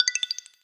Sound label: teleport; chat grid